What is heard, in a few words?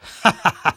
laugh short